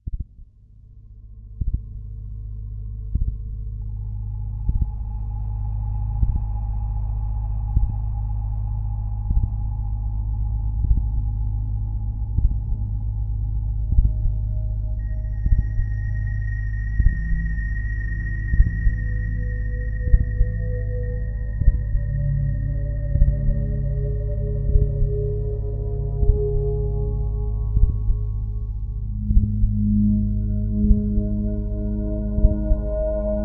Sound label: Trailer Music